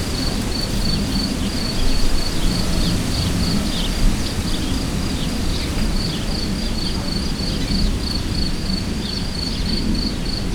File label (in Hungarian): repülő és a szél hangja is hortobágy, tücsök; allathangok; termeszetben